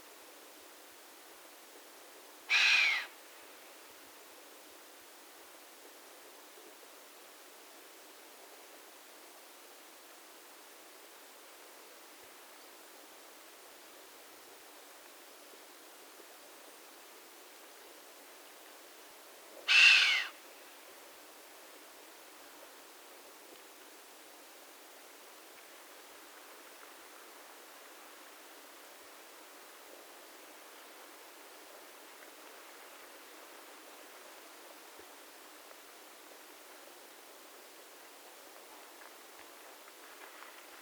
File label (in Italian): Camoscio; xenocanto; die gamse